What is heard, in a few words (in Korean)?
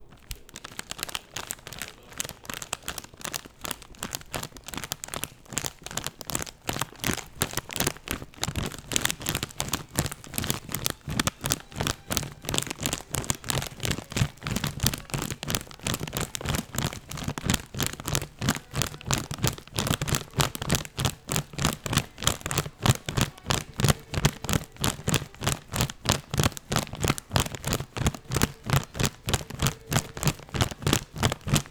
과자봉지소리